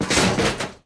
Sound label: bodyfall droid; impacts explos